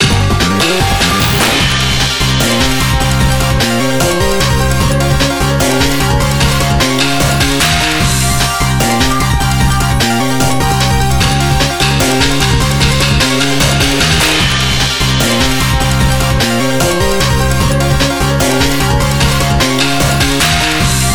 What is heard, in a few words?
Stage clear; Instant Brain; Xbox 360